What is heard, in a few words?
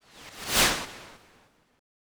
Harpy Attack